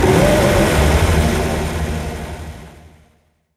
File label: V6T starting; AOS F1 2022